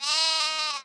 sheep; allods2d

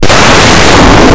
door

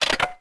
trap clicked; Severance

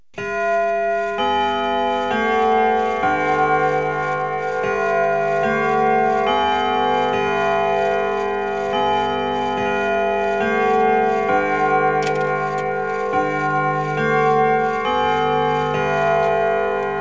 Westminster, Chimes; Household; 1,000 Sounds (16-bit)

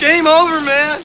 GameOver; 300 games for mac; Arcade; F.A.R.M. Patrol

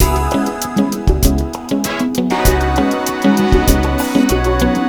Funk acid; FUNKACID3

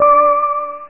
Match

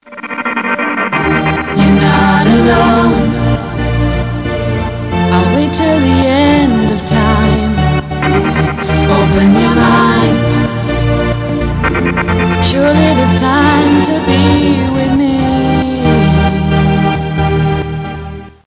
Electronica End

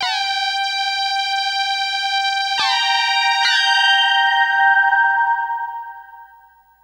High A4, Melody; Techno; TechnoMusicMaker

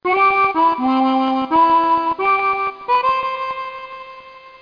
Anthem

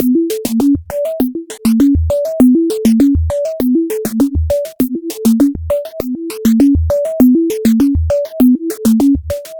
Nord Beat; Deeper Synthesis; NordBeat4

